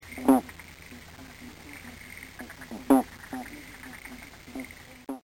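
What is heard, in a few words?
southern bullfrog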